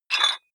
dumbbell pull up rattle soft, fitnessstudio

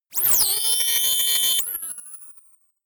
sci fi swell short; comedy-cartoon; soundpool

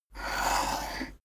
poker chip slide table

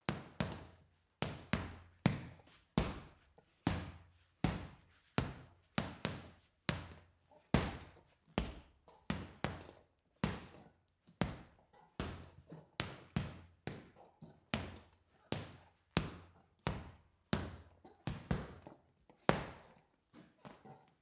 boxing punching bag boxing sequence; sport-freizeit; boxen

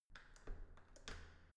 entrance door handle use

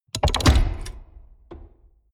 mechanics medium reverberant

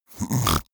snore; soundpool

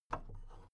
big plastic case handle take soft; objekte; kiste

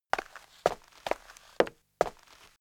step shoe on street

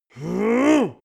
male exert; menschen; koerpergeraeusche; soundpool

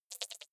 click erratic wide buzz, signale

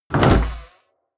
door wood open

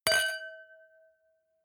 wine glass similar clink bounce hard; berufe; gastronomie; soundpool

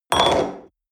espresso upset table medium short

